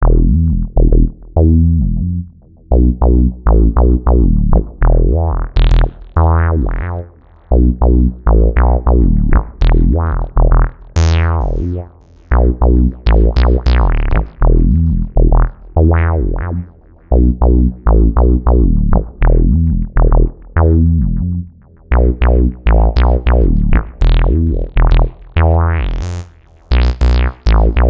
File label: ensoniq fizmo sounds bassline